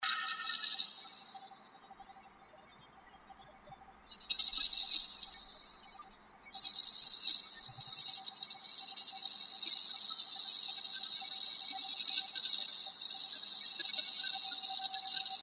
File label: activity, hdd noise; seagate barracuda 400GB; sp1604n